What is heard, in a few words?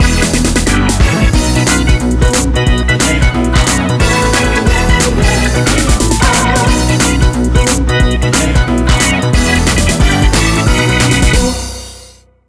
Jingle ~ Play Ball!; mario super sluggers; wii